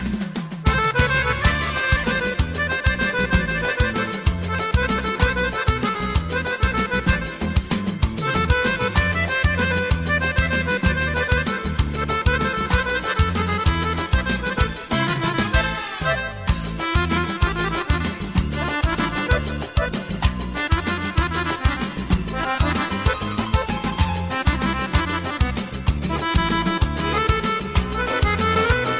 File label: kavkaz akordeon